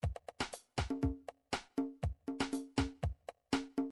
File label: drums rhumba, midi drum patterns